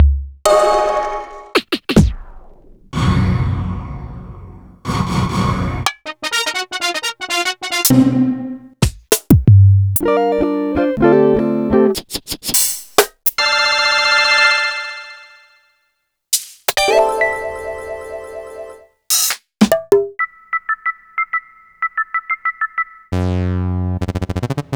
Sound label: Akai MPC500 INTERNAL